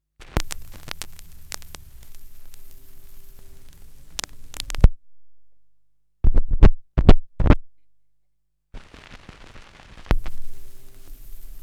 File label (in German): schallplattengeraeusche, Vinyl; Seite 1